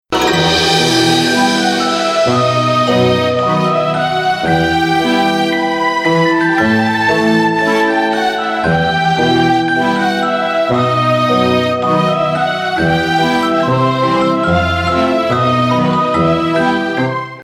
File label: wintery waltz